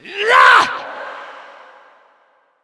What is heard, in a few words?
Attack; Creature; Bolvar Fordragon